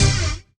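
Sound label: Rave Synth, Dance Mega Jungle Rave; Best Service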